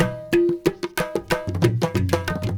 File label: INDIA R, Heart of Asia, IND ENS